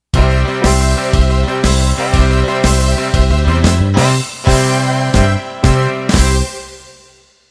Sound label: Country Shuffle End